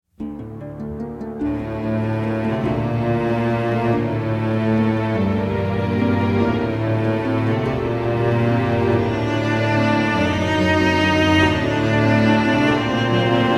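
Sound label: Campaign Music; Heroes of Might & Magic III HD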